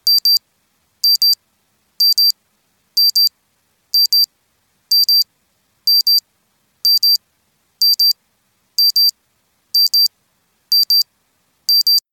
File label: analog watch alarm